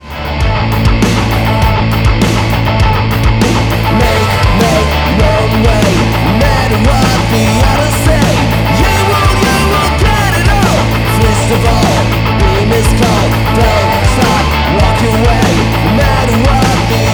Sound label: Slow Nights Snippet Disco